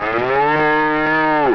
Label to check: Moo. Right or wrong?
Right